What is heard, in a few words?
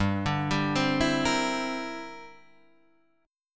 G 6th Suspended 4th Sharp 9th, guitar chord; G6sus4#9 arpeggiated; JGuitar